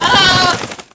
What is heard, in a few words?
fire, weapons; serioussam2; clawdovic